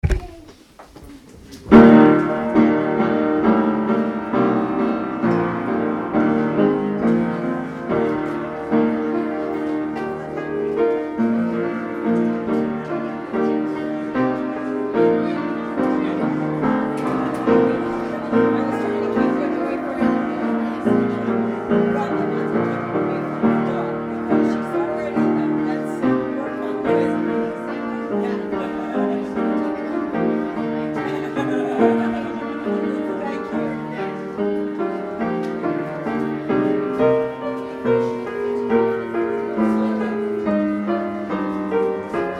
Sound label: Postlude on Piano; St. Peter's Hebron